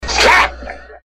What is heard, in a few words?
Slap